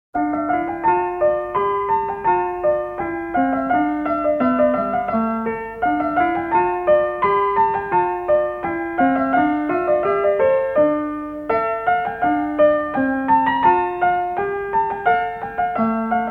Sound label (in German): Kleine Gavotte